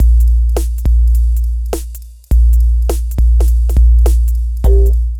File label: DRUM LP; East West - Phat & Phunky CD2; 90's Sample CDs